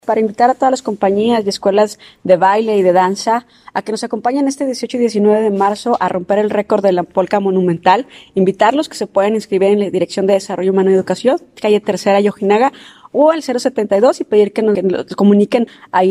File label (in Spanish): POLKA